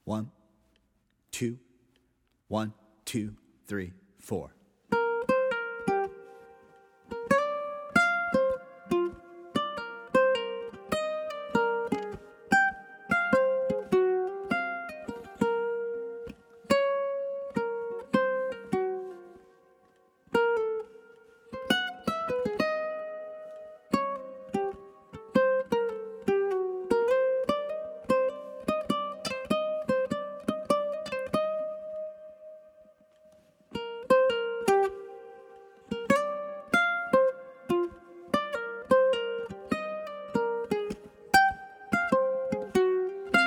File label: uke